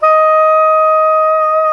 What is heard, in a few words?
Soprano SAX mp D#5; Giga Samples Collection